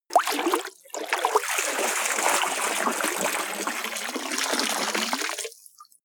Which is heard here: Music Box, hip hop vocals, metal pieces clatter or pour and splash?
pour and splash